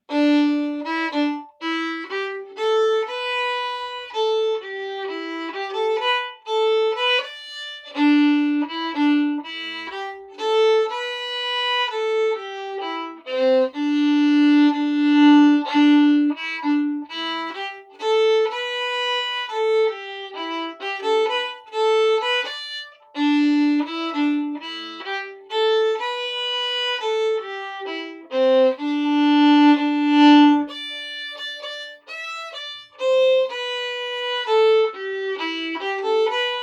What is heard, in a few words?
Mairis Wedding